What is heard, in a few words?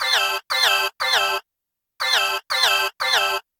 Fire Drill, alarms; frameworks base data sounds